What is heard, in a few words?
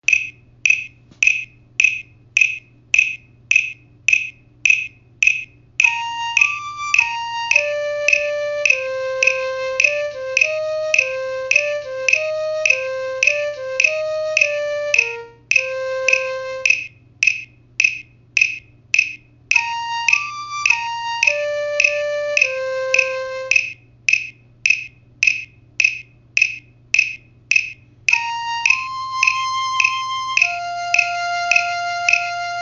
flute